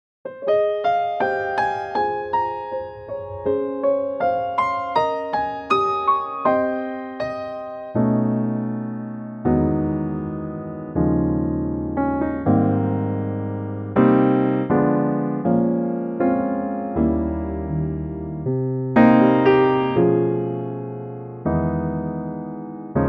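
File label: piano A flat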